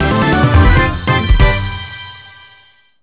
JINGLE MAP GET; maimai

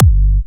Kick; WAProd Free Kick Samples 2018; WA Kick Pack 6

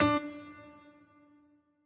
piano riff intro

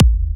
Kick; WAProd Free Kick Samples 2018; WA Kick Pack 1

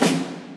orch snare

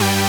synth

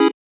Chord

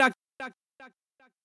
voice

